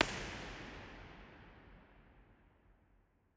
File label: lady chapel impulse response, 44.1kHz; ely